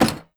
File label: auto turret movement click; Rust